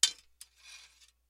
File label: sword hit